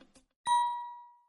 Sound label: ringtone